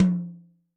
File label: Maple, Yamaha DTXpress-II Tom